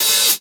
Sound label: OP Hihat; Open Hihats